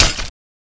plasma blade flesh

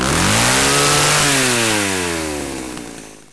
chainsaw attack miss

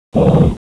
Shark pain

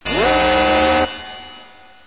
siren

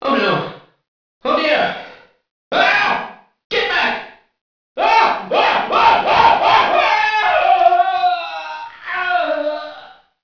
screams

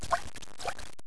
swim

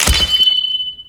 level up; gangan

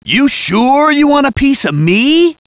hurt soldier

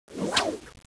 whip swing

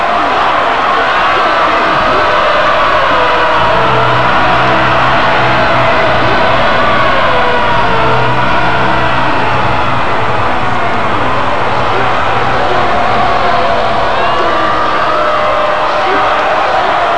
horror

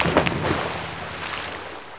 water enter

